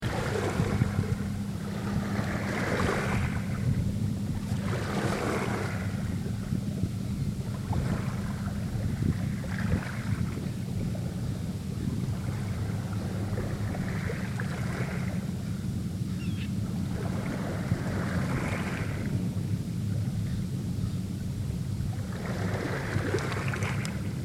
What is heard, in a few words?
Mackinac MI Beach Ambiance